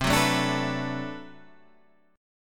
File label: B Minor 7th C, chord